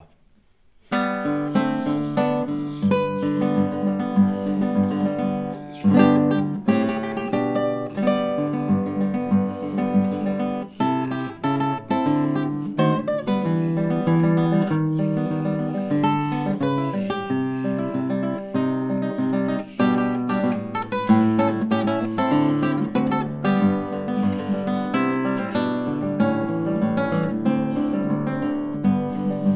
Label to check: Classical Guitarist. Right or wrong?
Right